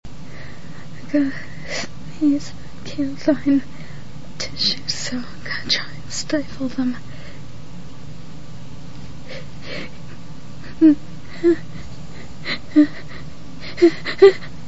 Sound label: stifled, female